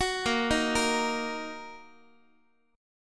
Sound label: Bb Suspended 4th Sharp 5th Bb down Guitar; Bbsus4#5 arpeggiated; x,x,9,8,7,9